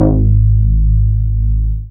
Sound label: BAS MINI, MOOG; Sound & Vision - Gigapack II CD 1